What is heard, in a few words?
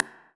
Percs, Elektron MachineDrum; SPS1 MKII